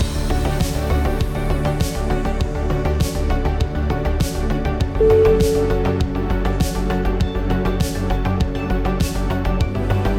80s night drive

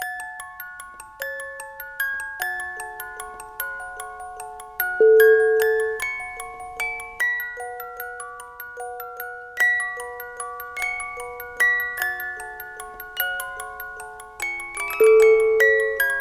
old music box

